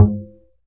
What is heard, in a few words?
Upright Acoustic Bass, D STRING